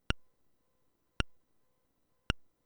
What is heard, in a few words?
Clunk, clunk, clunk!; lemmings ibm pc adlib 1991